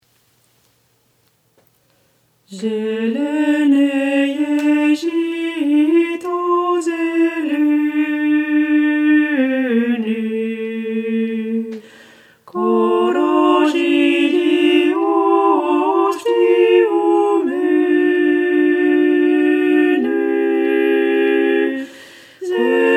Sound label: zelene zhito tutti